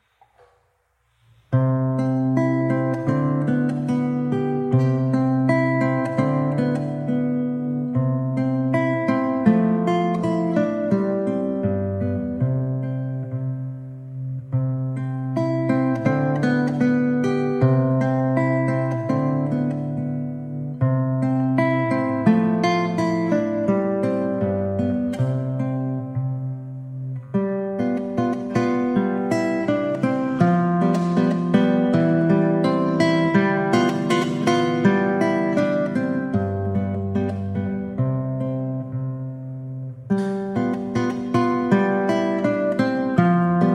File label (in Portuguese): violao poco allegretto; carulli